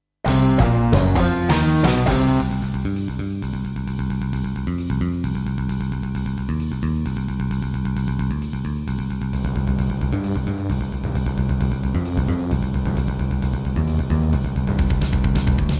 Turtle Beach Rio; sigil doom episode 5

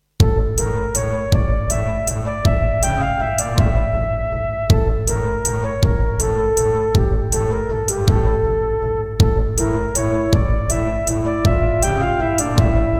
Tascam Pocketstudio 5

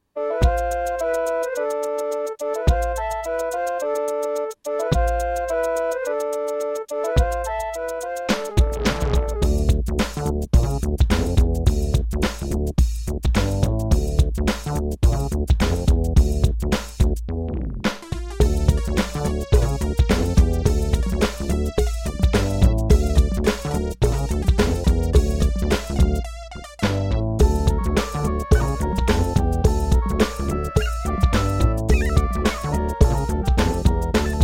Egypt, Procomp Pro-Multimedia; Lost Vikings

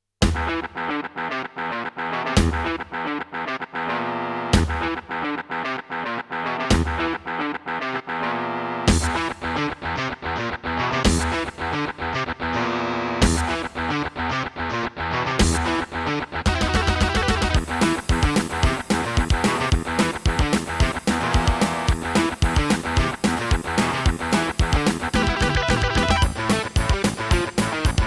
Boss Dr. Synth DS-330; Doom

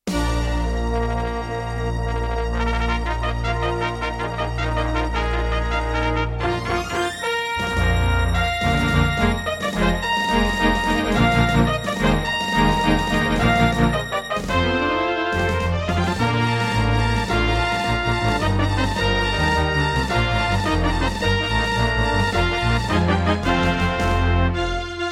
Intro, Serdaco Dreamblaster X1; Dark Forces

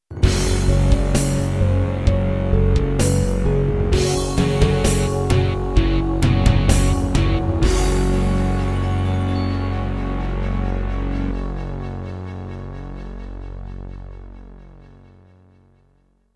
Introduction, Miditemp DoX-1; Doom (Sigil)

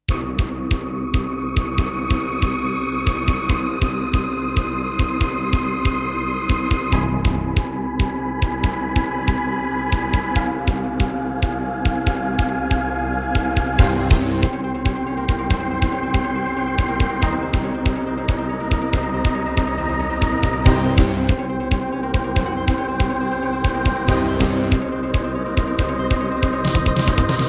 Trust Korg Super Sound Module; sigil doom episode 5

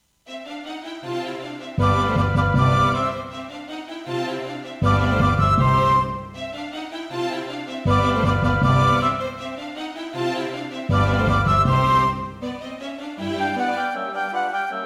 Roland SD-50; GM2MODE; classical; Mozart; Concerto K467 partial

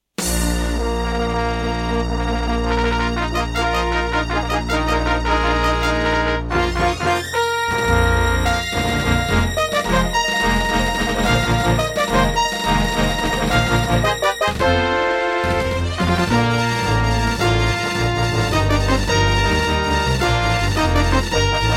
Intro, Serdaco Dreamblaster X2GS NATIVE; Dark Forces

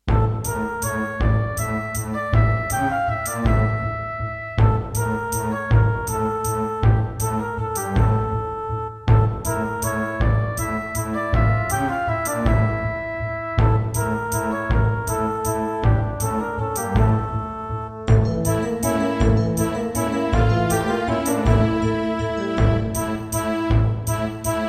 Roland SC-88 Pro 88MAP

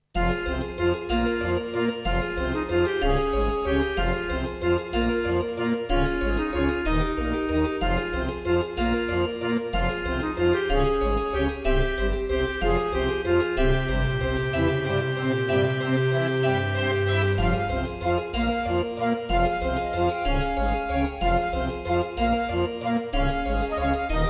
Roland SD-50 GM2 MODE; Death Gate

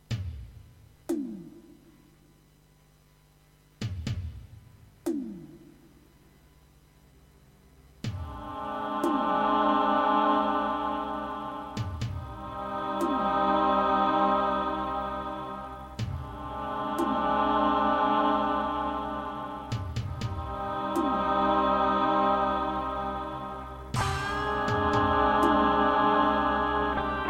EMU Sound Engine; Doom - E1M8

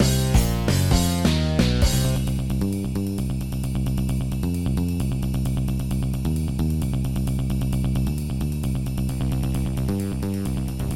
Yamaha SHS-500; sigil doom episode 5

